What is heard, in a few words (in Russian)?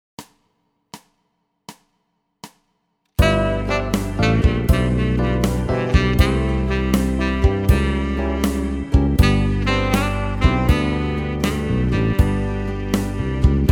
Track plyus potom minus